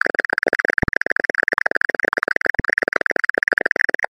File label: event text sound